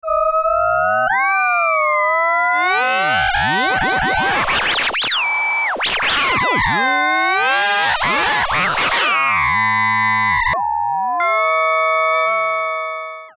crossmod

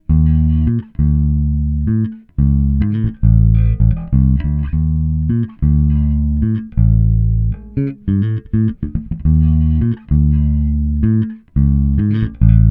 Midcut

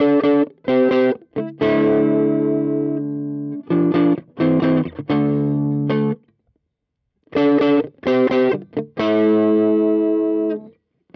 Fender Studio Kompressor mid clean boost; Compadre

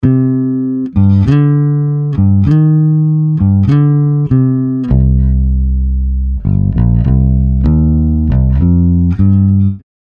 Bass Pulse Grooves; Scoring

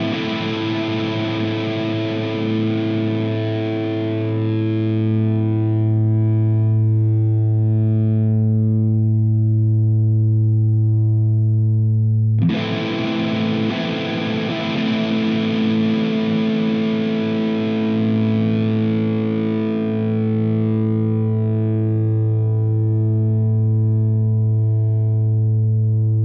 Schecter Sun Valley Shredder Both Sustain

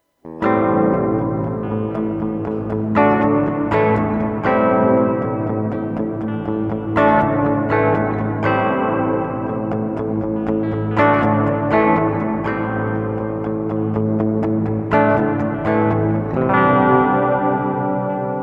Dark Modulation

